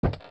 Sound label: Steps Bridge; zelda; OOT